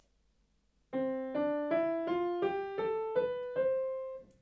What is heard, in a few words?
Systematic Scale C Major middle; MATLAB-Chroma-Toolbox 2.0